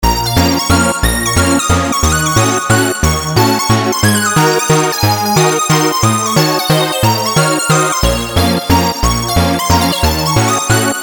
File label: Chiptune